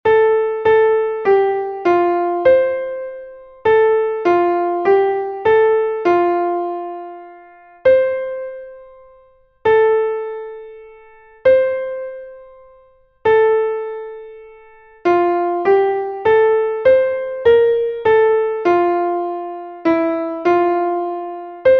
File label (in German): klavier; MelodieMeister